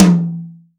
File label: verby tom drum sound e key, Royality free tom single hit tuned to the E note. Loudest frequency: 1039Hz